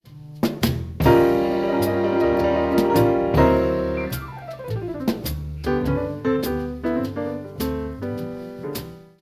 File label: jazz